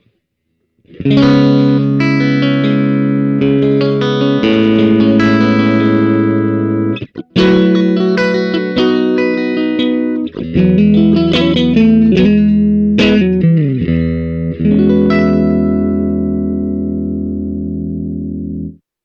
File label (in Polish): Fen Czysty MS, humbucker; classic strat humbucker